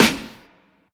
ambient snare drum sound a sharp key, snare single hit tuned to the A# note